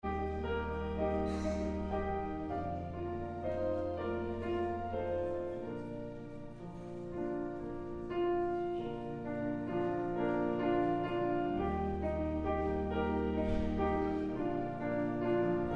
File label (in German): Vorspiel